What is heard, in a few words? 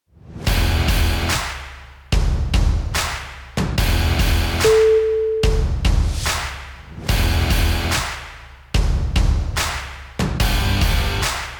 rock that crowd